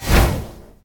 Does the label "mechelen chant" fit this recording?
No